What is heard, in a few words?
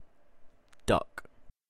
Duck